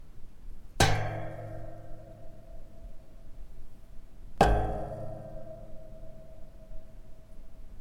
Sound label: hitting a wire cage